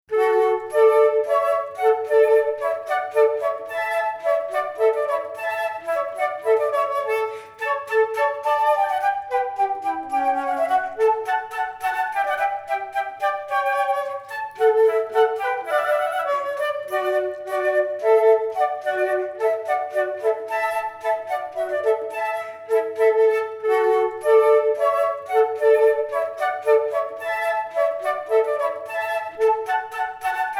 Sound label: Hornpipe, duos